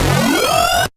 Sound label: F Destruction, Dubstep Synth Hits; Oneshots; Serious Dubstep